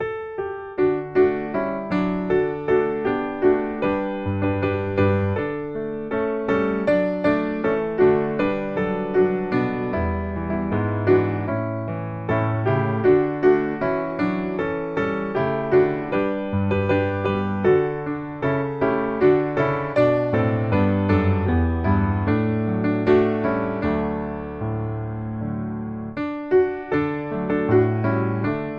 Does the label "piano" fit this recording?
Yes